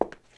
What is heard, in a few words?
stone step; Golden Crayon Sounds